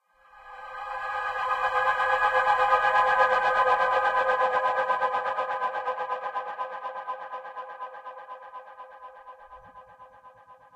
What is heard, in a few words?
darkness humming; st mission; hs c4